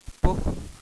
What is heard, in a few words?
owl